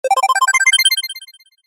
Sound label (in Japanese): 決定 高音 レトロ モノラル